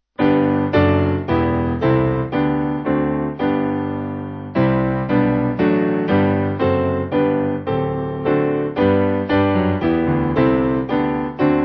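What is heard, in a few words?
Piano, backing; All Things Praise; Laudant Omnia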